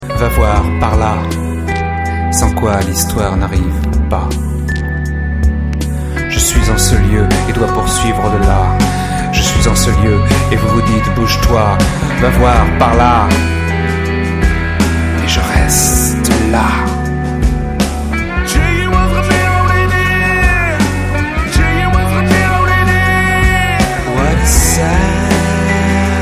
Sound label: sad song